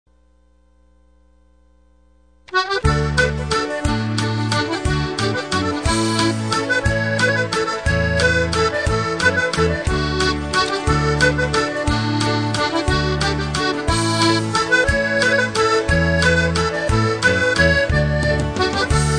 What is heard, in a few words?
waltz